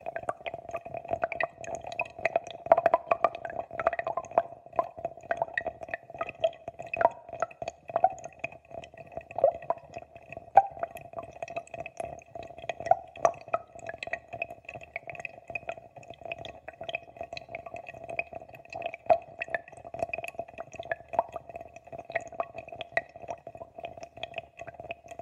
FOUNTAIN Underwater Peculiar Warbling Dribbles

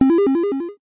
multimedia correct tone beep

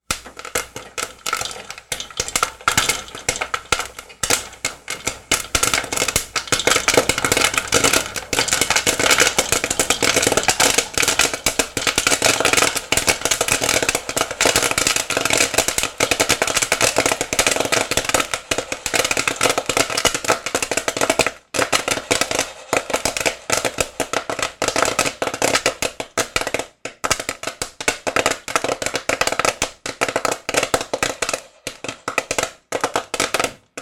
food popcorn popping in pan; sound effects one

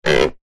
household sofa arm creak

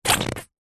cartoon rubber movement; zapsplat

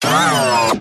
science fiction robot large mechanical movement

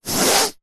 human sniff snotty; zapsplat

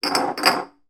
foley beer bottles slide into each other

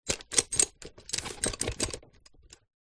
sport body builder belt handling

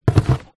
hand in item remove cardboard box; foley

